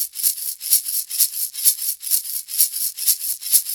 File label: Shaker Loop, 128 BPM; Vengeance Samples